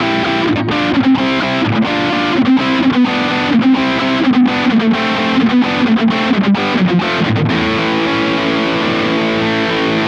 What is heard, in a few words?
Godin Radiator Burst high gain bridge